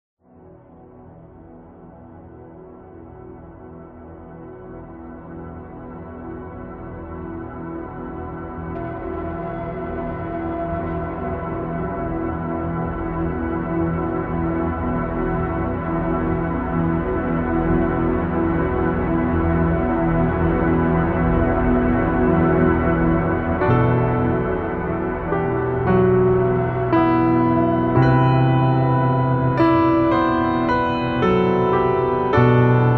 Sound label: Waves